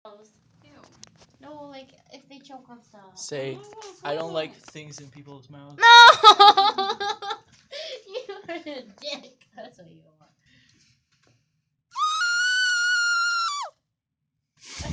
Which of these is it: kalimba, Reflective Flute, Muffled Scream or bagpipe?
Muffled Scream